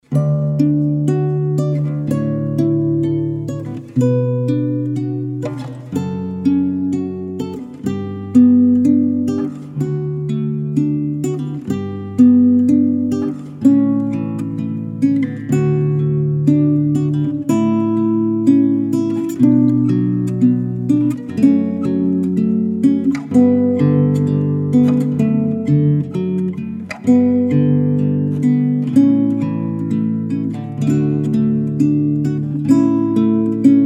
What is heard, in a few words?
Canon In D, classical guitar